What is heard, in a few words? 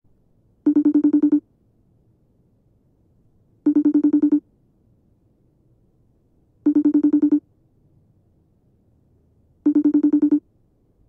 facetime, phone sounds; roadphone